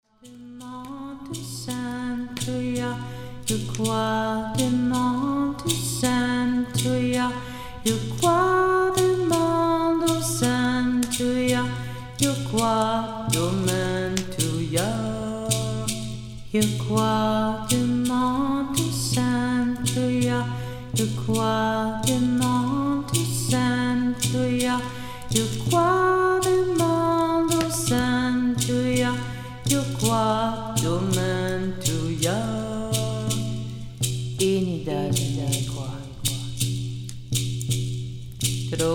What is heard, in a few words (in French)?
chant de purification par le Feu